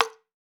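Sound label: Mexican TAMBO, Latin Shakers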